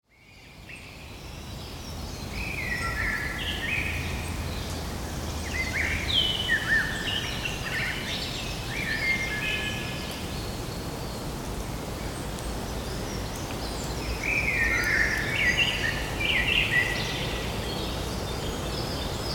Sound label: nature sounds